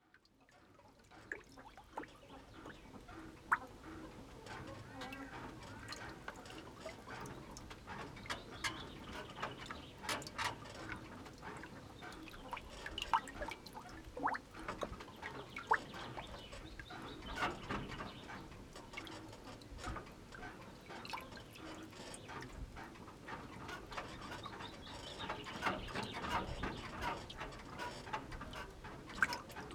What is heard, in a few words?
field recording in mooste; murmer